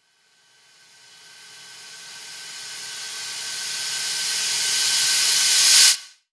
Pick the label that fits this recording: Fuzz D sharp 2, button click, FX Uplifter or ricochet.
FX Uplifter